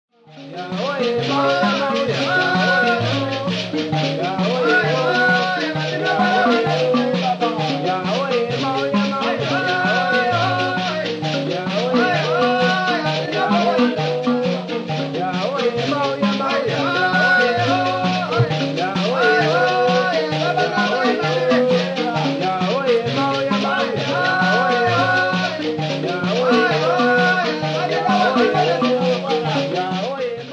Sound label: mbira